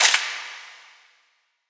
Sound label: drill shot